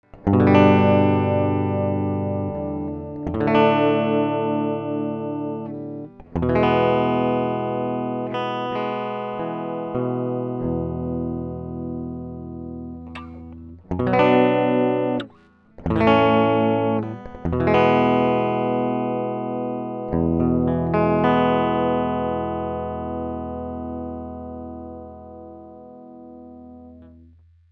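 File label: arturia audiofuse studio electric guitar; elektrogitara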